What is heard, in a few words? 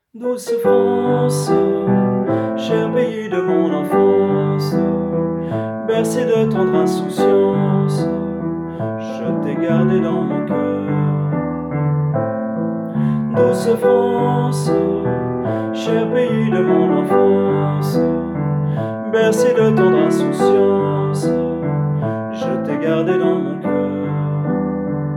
Quodlibet douce france; projet vocal 2019-2020